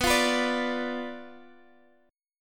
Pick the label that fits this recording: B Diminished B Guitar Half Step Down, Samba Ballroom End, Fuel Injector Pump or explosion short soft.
B Diminished B Guitar Half Step Down